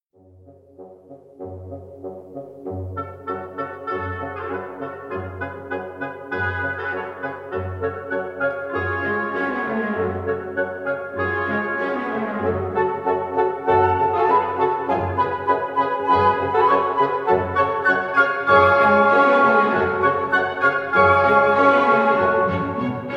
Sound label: Dance of little swans short